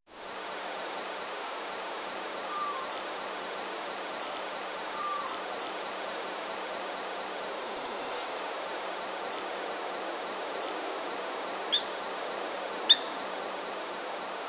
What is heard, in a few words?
birds chirping gentle